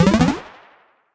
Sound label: hit